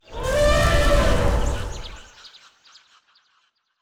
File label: savannah planet, ambient; Stellaris